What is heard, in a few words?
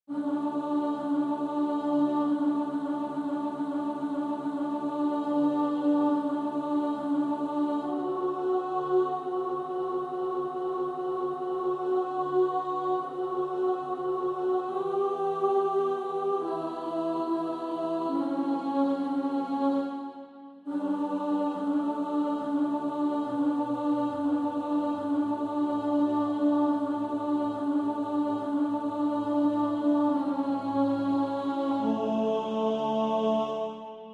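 alto, practice music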